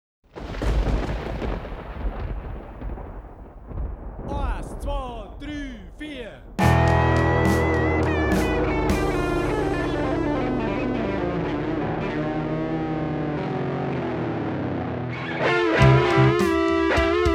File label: fc song